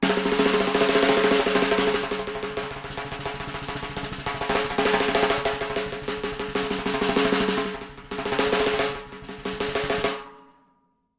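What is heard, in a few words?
Drums Snare Fast Roll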